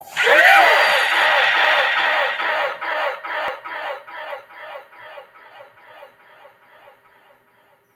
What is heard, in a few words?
jumpscare with bang; the big red thing; palm town